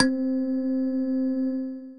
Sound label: Kalimba Atk, Mallets